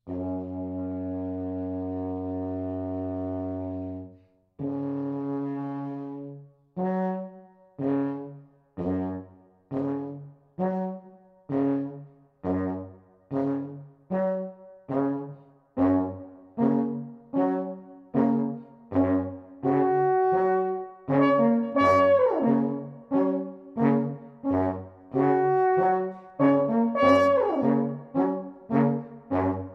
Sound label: alphorn